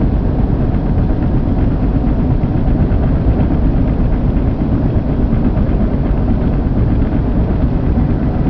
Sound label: engine; rebar